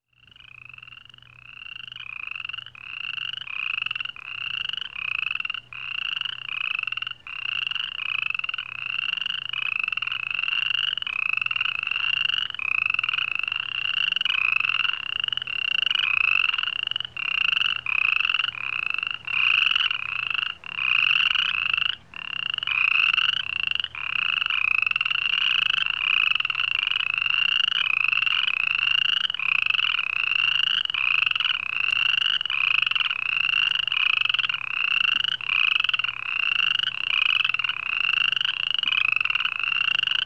frogs; animals

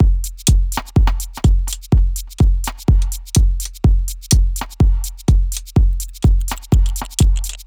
Club Dance Beat

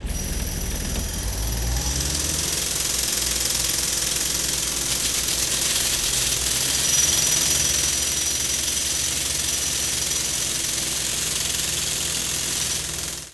Street work jackhammers

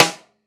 Snare, Live Trip Hop Drums; Drum Samples; Smoke Signal

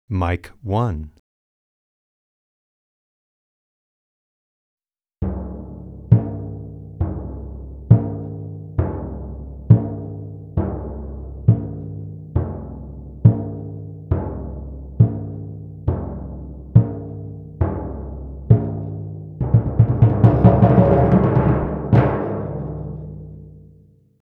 Tympani

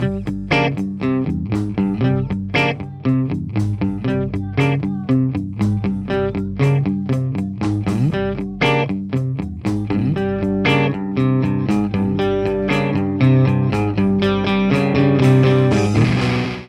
Gtr

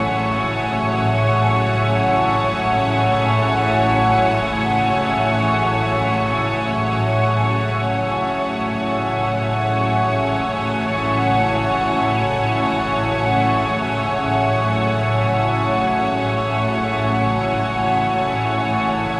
F#madd9 Ambiance, Ambient String Loops; Suspense; Diffraction

